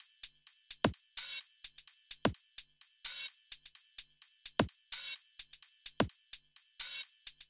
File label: 128BPM Wobble Drums; Vengeance Samples; Wobble Kit